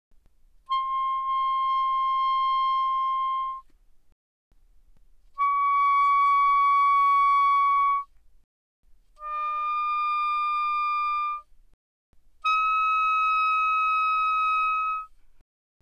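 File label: Soprano Sax No Vibrato pp C6 Eb6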